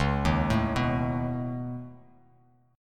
Db 7th Suspended 2nd Sharp 5th, Bass, Db7sus2#5 chord; Db7sus2#5 arpeggiated; JGuitar